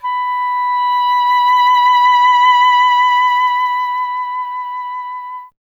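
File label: Wind OBOE B; Miroslav Vitous - Solo Instruments VOL-2; Solo lnst. II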